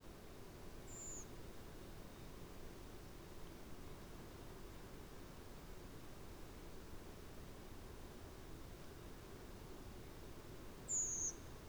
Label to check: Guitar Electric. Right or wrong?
Wrong